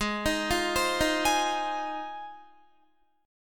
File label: G sharp 6th, Guitar; G#6; arpeggiated; 16,18,15,17,15,15; 4E,4A,5D,5G,5C,6F